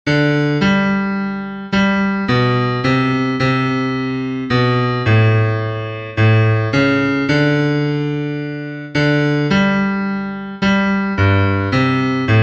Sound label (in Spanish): BAJO, himnos en piano; cantos del camino